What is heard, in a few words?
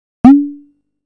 Wasp-Drum; drums